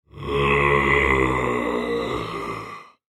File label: Frankenstein, Monster, Groan; Horror; Monsters (Sound Effects:Horror)